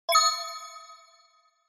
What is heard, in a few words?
Accent